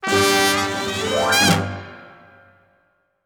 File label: Jingle mini game win